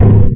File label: glass pane knock